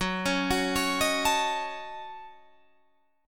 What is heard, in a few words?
Gb Minor 6th Gb Guitar Drop D